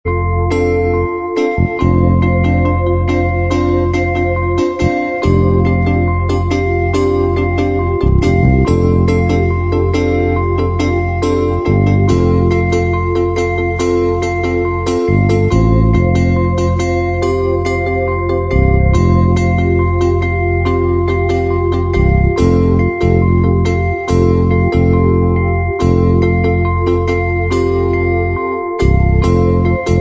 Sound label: Trance Status